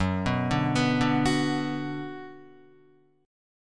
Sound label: Gb Suspended 4th Sharp 5th, Guitar; arpeggiated; JGuitar